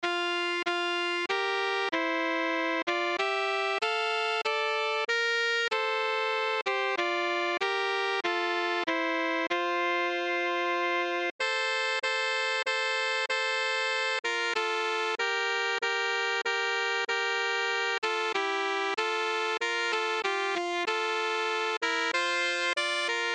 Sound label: My Country, Tis of Thee - Sax Duet